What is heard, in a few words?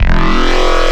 Screetchy, Wavetables; Cymatics; Outbreak Wavetables; Serum